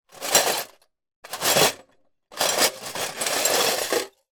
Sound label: foley glass broken movements in metal tin; zapsplat